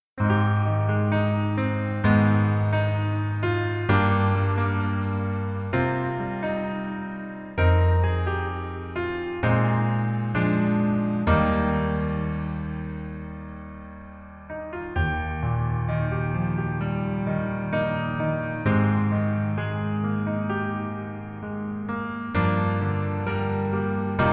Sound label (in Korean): Piano ver, 멜로디MR 남자키